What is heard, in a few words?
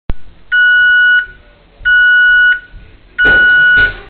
oven timer; reversevape